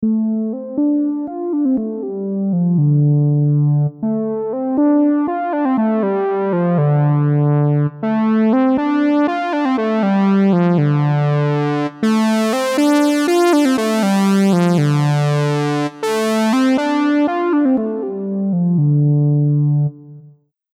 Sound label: Moog Solo Cutoff